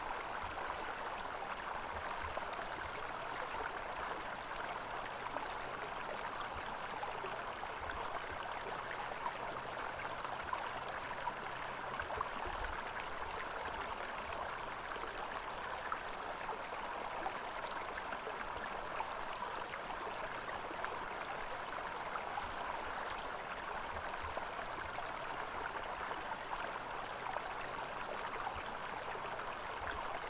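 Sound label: ambience creek; midnight guns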